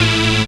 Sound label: synth a, Rave Synths; Dance Synths